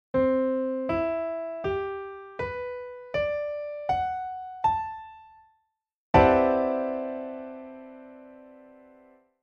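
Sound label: chord piano maj7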